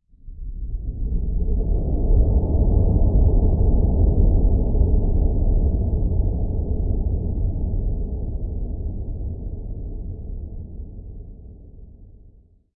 Space Ship Passing By, Large space ship pass by in space; space bypass